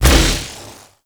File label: Contamination Wave Proj Impact; Focus; Madurai